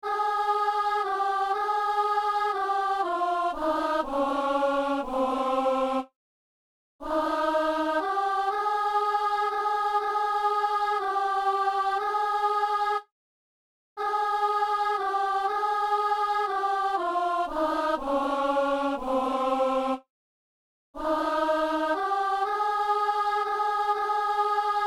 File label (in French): psaume soprano; Messe du 17 janvier à Saint-Denis; Psaume 41(42); Comme un cerf